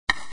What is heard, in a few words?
Wave